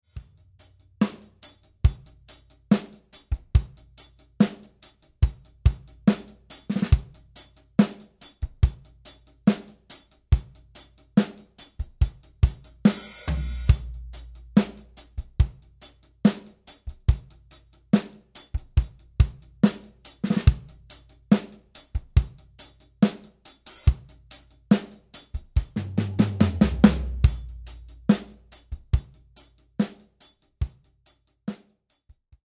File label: 80s pop